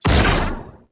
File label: Pulse Laser Shot; Warframe; Railjack; RailJack Weapons